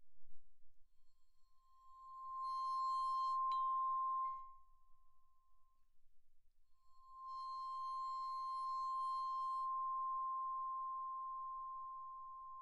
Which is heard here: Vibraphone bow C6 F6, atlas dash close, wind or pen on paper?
Vibraphone bow C6 F6